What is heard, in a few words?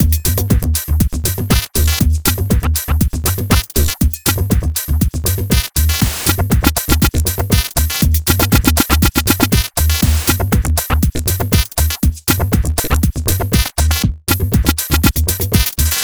music